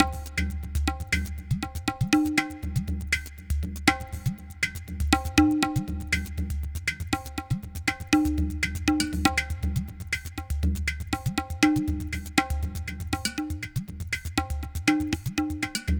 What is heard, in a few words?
Tablatron, Processed Percussion